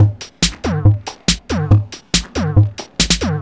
TECHNO BEATS 140

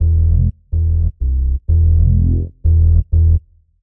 DEEPHOUSE BASS 125 A

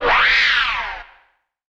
SPACE FX 140; SpaceSounds

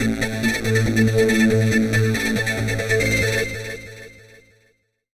HARD EXTRA 140, Hard Trance

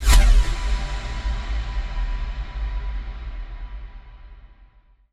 TECHNO FX 140

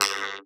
Vocoded Drums; RadioactiveSandwich - Homunculus

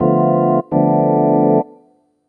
DEEPHOUSE ORGAN 125 A

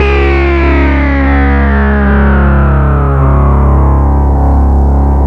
SYNC FEEL C2; OBMX-SYNTHS

